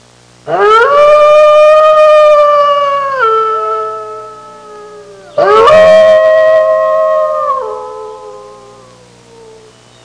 Howling wolf long sound

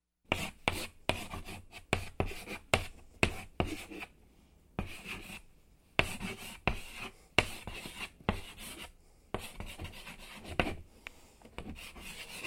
Chalk Writing on Chalkboard

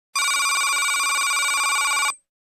Synthetic Telephone Ring

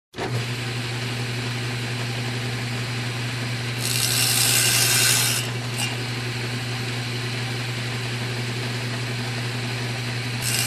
Electric Cutting Machine

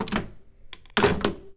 Pay Phone Pick Up Hang Up

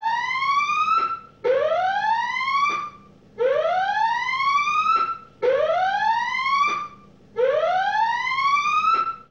Classic Headquarters Alarm

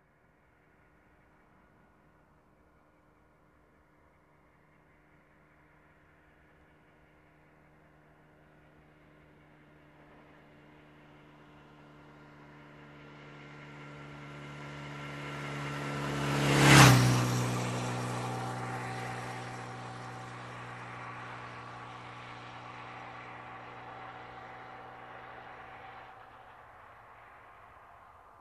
Motorcycle passing by close

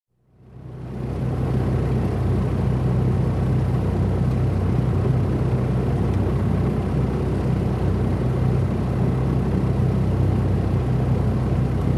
Aircraft Engine Blades Noise

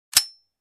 Handgun cocking

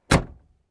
Closing Car Door

